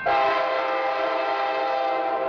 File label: f59 horn; amtk f59phi k5la; open rails